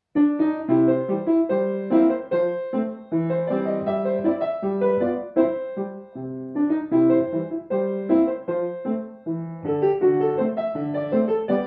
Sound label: entertainer; capella audio2score